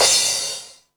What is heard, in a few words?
CYM, Dance Drums